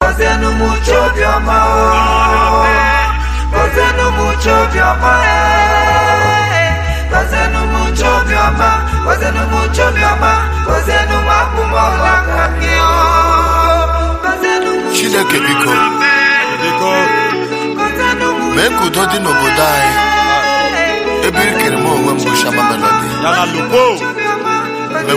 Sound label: Gospel